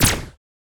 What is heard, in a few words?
laser; spacemap; lasers